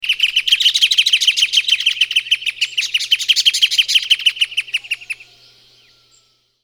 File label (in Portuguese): casaca de couro da lama